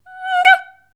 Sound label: F#5, Voice